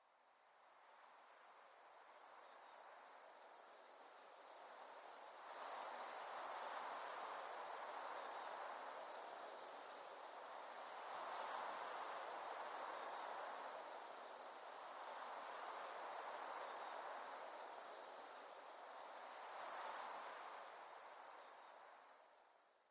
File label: Wind blows in the trees